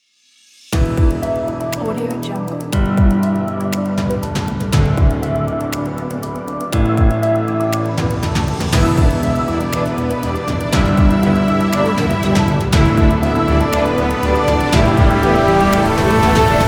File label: news intro ident